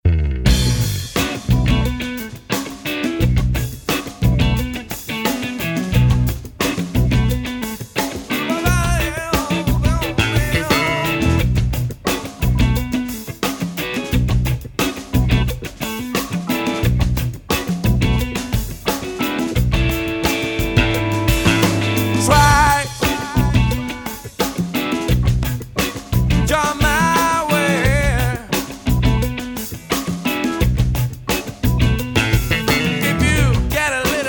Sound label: music